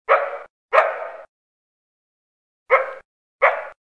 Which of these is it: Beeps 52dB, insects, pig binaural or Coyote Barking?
Coyote Barking